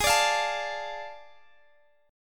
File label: A Major 7th Suspended 4th Sharp 5th